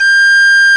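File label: recorder D, woodwinds; RECDR; Roland - LA Composer vol. 1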